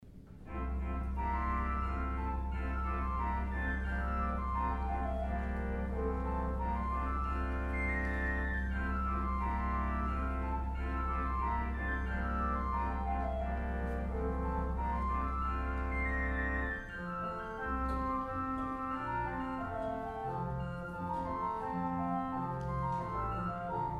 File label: Fife Tune